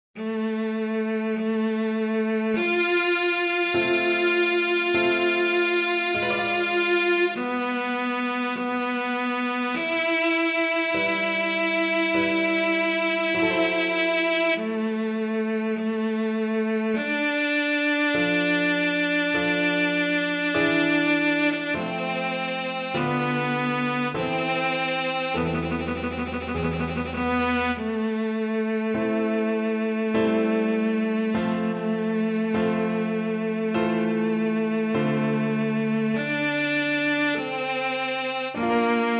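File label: violoncello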